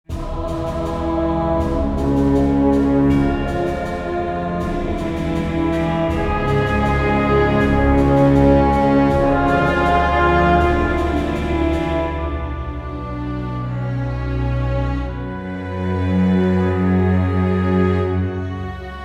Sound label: Loading; Call of Juarez: Bound in Blood